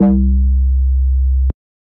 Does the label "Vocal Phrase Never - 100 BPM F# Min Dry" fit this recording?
No